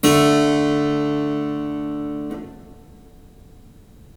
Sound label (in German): gleichstufig Quint